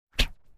sword gesture

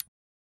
cursor, button

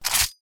machinegun slide

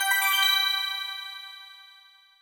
success, button

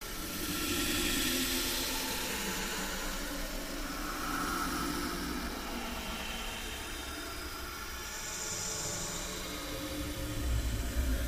different dimension

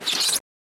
slide

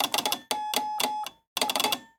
morse code sos

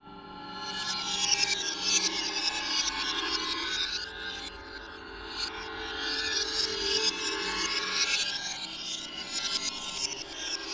fear

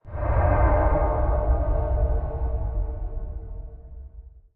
trauma

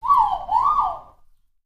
bird

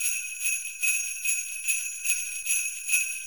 santaclaus bell

